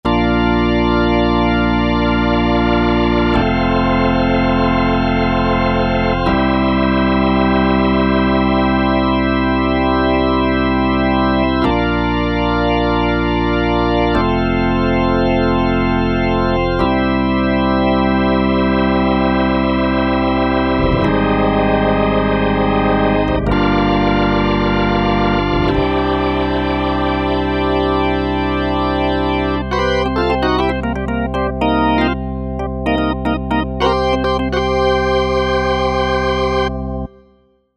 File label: Rotary Organ